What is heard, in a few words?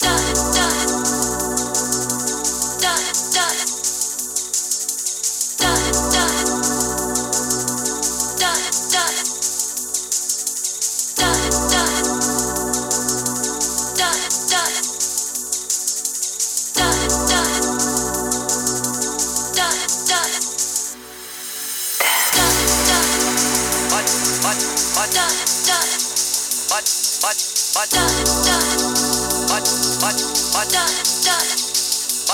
Drum'n'Bass; Japanese